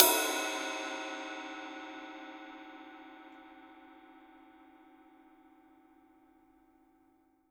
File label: CYM, Real Drum Kit; REAL DRUMS; ROCK KIT